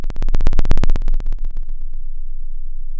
Sound of an engine revving

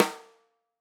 Tam Rim, drum

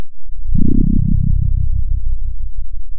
pop, Sound of a dinosaur

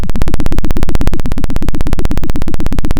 pop, Sound of a helicopter

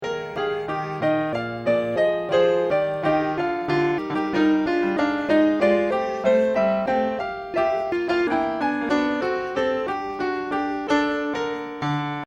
rev short piano mono

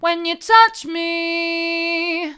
when you touch me mid, vocal

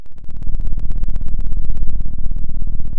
pop, Sound of an engine revving

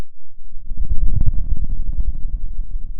Sound of a dinosaur

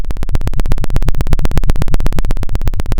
Sound of a helicopter

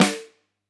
K1 close Flam, drum

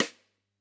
K1 close SdSt, drum

